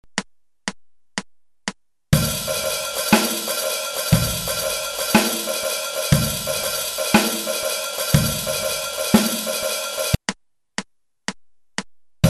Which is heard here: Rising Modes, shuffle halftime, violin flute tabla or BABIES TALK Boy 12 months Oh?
shuffle halftime